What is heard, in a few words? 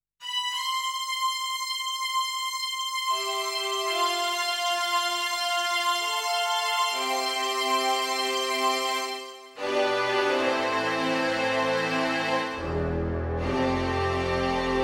PX-3 Strings Pad